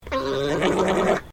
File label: Animal Alpaca Male Mating Call